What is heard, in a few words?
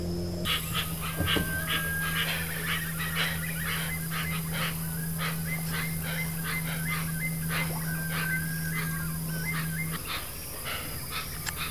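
Hoatzin, bird; گندمرغ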